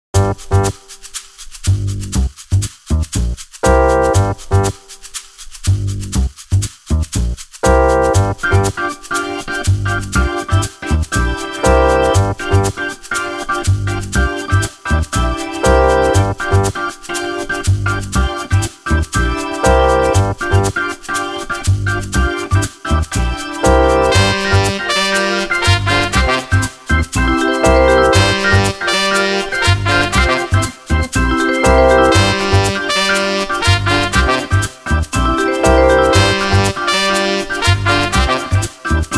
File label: Jazz Break